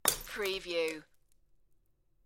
Glass Bottle Break

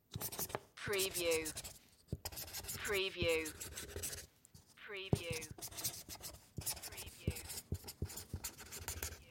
writing with a hard marker

